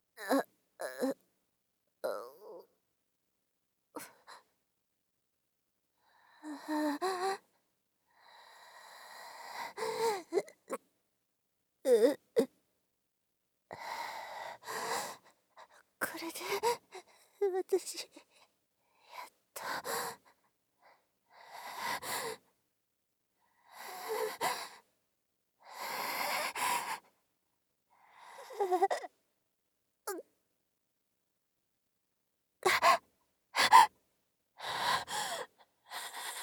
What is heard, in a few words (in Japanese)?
衰弱しながら; 効果音なし